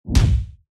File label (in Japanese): ハンマー 通常攻撃; 武器効果音